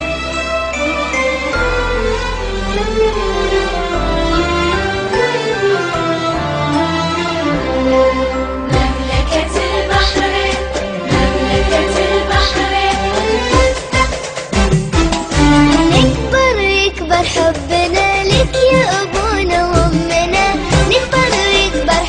national song ringtone

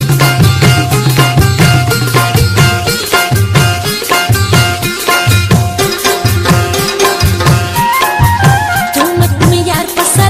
pashto ringtone

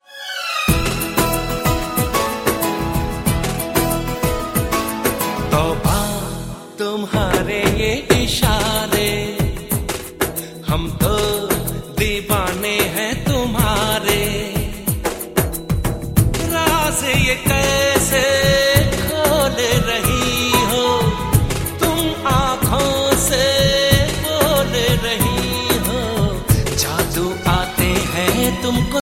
Hindi movie ringtone; chalte chalte 2003

